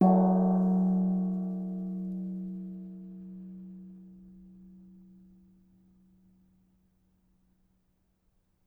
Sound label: Gong; Instrumentensammlung